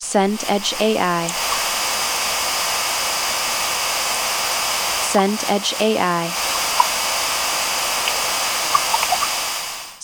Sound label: stream small